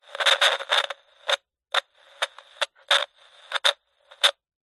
cb radio cheap static through speaker clicks

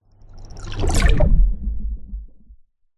Cinematic Underwater Whoosh

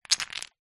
leisure puzzle pieces few down on ground; jenga; zapsplat

